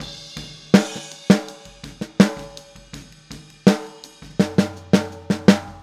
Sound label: Boogaloo Fill Snare, 82 BPM; Sonic Foundry; Drums from the Big Room The Mixes